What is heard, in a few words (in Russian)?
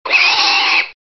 zvuk kasperskogo vizg svini